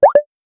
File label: a short playful swoosh, button